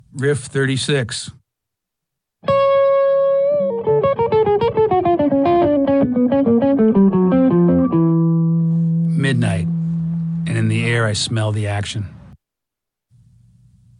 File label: Riff